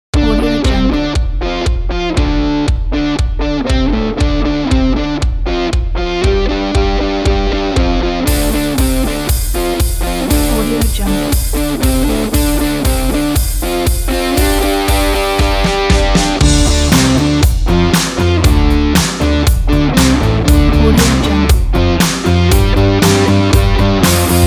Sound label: energetic driving rock; audiocasket